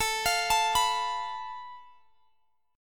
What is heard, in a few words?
A Suspended 2nd Sharp 5th